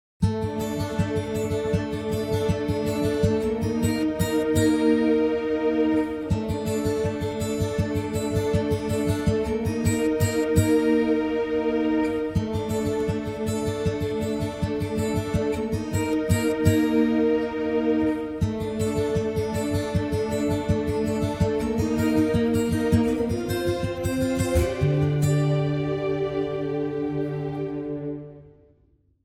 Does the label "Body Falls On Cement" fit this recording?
No